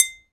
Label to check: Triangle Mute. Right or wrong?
Right